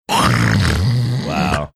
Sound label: making sleep apneaic snoring